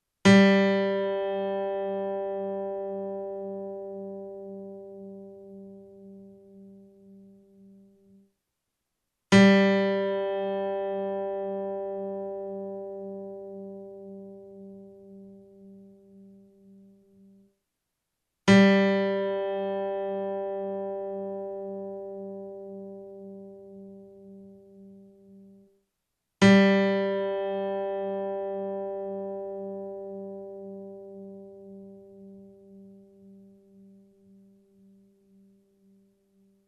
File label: 3rd string G, Teaching Clips; Guitarists; Tuning Notes